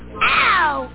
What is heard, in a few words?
ow!